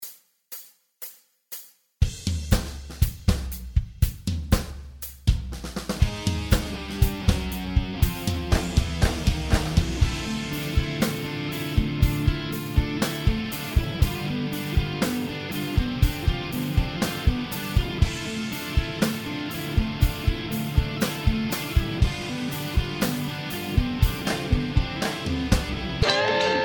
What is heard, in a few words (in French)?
gratte